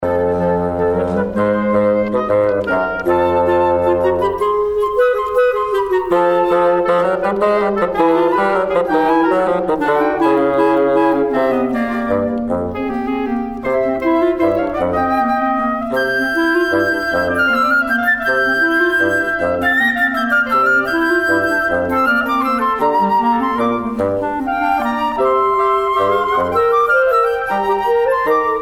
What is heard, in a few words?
woodwind